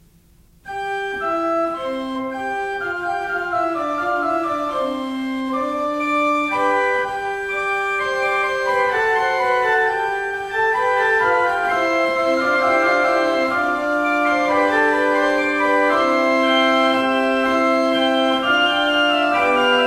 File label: Improvisation Principals 8 4 2 with ped; Lititz; Tannenberg 1787 Lititz